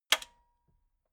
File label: metal button radio spring high